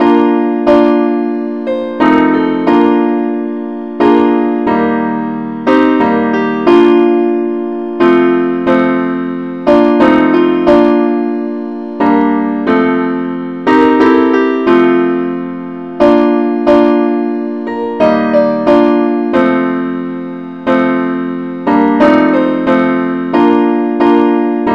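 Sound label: simple accompaniment key B flat; I need Thee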